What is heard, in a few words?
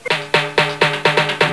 tamtam; voxrom; boryslaw